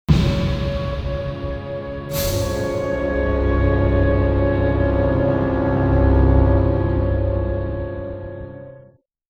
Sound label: Success; Sniper Elite Berlin 1945